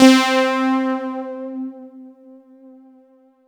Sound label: Roland JV 2080, interval; MG INTERVAL